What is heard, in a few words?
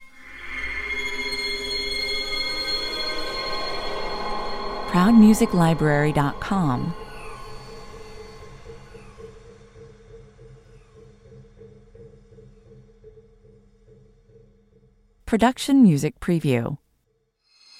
ultimate horror (accents only) 98 bpm